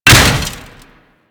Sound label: Mace Impact Metal